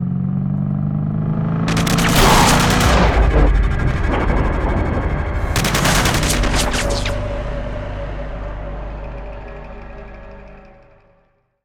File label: drive By Mock; netent; narcos